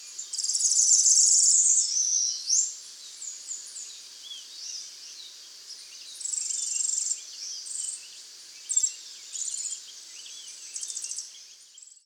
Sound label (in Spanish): Semillerito Cariamarillo